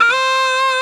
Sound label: Ooo; Mo' Phatt; Digital Sound Factory